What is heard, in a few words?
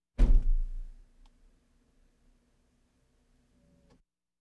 Automobiles various